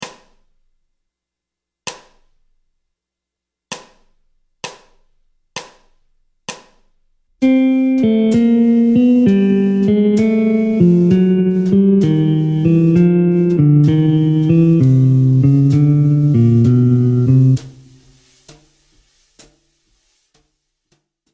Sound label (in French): Gamme Bop desc Pos 52 C Majeur, guitare jazz; boper ses gammes position 52